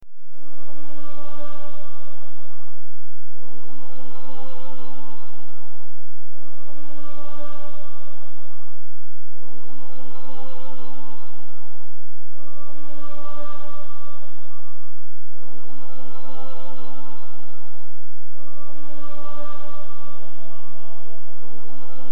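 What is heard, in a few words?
Loop choir Cm 160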